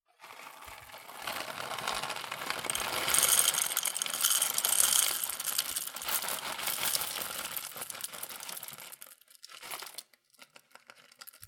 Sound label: Coffee beans are poured into a small metallic bowl; sound effects; coffee pouring